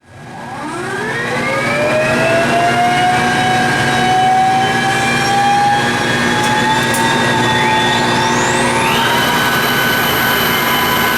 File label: chiller start